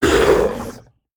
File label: worm death; factorio; Factorio 0.14.21; creatures